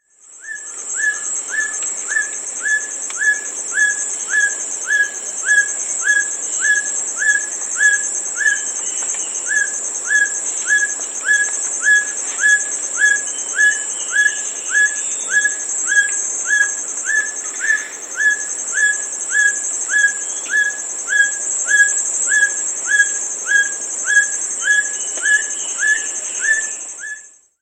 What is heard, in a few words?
Black Bushbird; S. America